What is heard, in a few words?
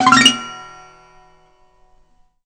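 harp up; BERZARRESOUNDZ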